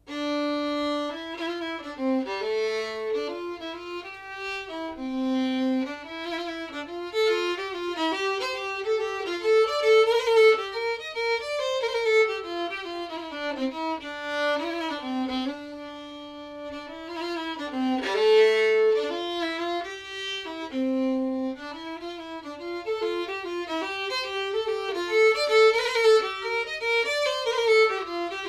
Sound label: Waltz